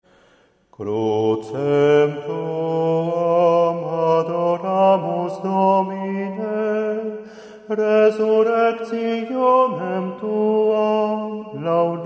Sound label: Crucem tuam